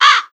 crow

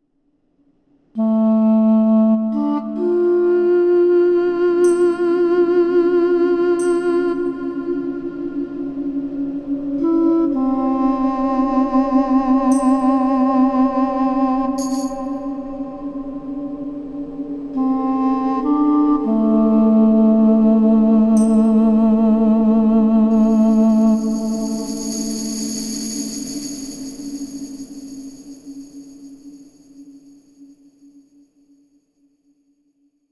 Transition; Ozymandias

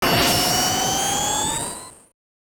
Warp; Gradius; MSX SCC

